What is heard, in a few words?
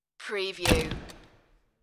office door closing